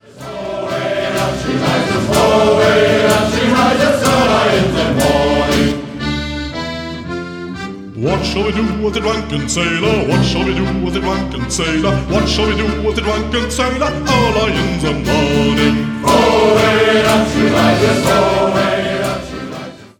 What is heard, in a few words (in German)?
Shanty Chor